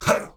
Grunt Hit; Knight

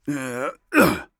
Warrior Grunt Slow; Realm Royale; Hi-Tek Trooper

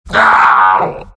pain; centaur

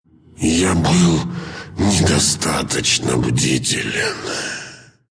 death, ru

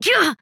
Death; ChurchHill

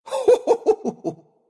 laugh, ru

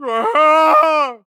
Falling

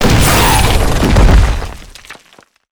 Radiant siege death

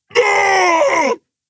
Falling; Makoa

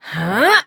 Grunt Attack; paladins; Seris

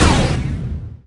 projectile launch; Dota 2; Chen

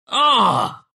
pain, ru; dota2

